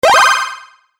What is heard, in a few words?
Classic Delay Game Hit, video game sound effects